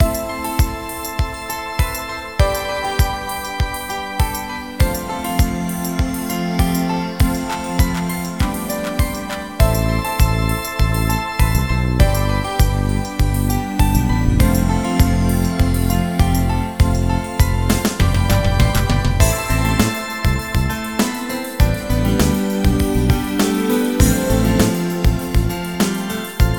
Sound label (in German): kirmes; Ultimedia; film